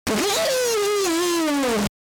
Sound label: Pikachu SFX; RBY